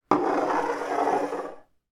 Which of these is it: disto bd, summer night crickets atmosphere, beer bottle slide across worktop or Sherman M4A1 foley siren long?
beer bottle slide across worktop